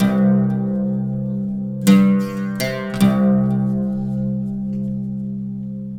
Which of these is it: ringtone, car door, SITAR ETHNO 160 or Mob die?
SITAR ETHNO 160